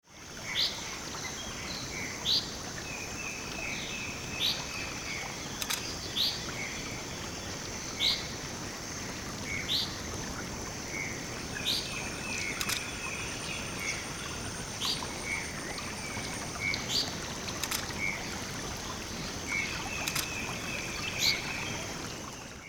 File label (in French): Hirondelle ailes blanches